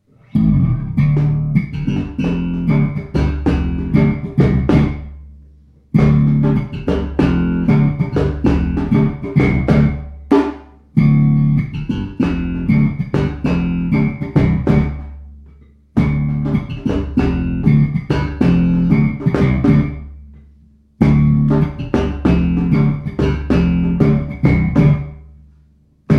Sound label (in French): Basse Cajon